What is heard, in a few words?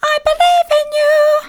i believe in you soft, vocal